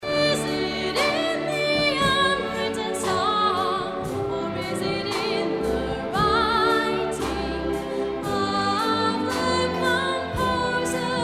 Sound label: noise, choral orchestra